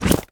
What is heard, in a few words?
menu move; soldiersfury